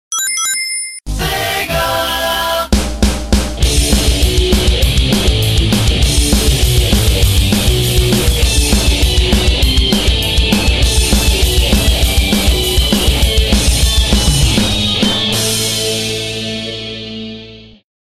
intro rock; sonic

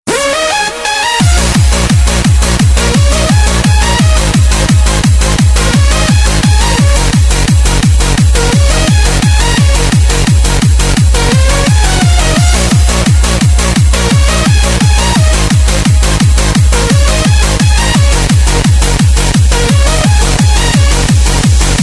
techno dance

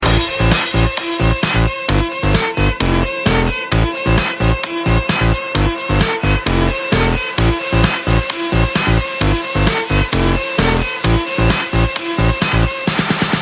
electro violin

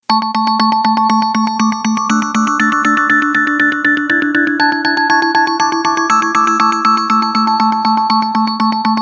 nice sms tone

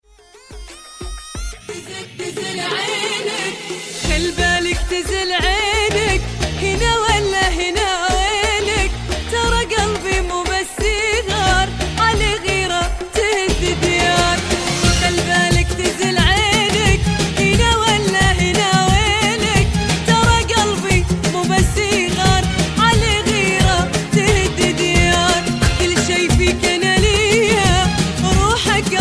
arabic

